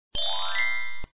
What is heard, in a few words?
sound Complete; swiffer